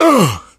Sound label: melee swing, player survivor voice